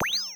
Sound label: Boost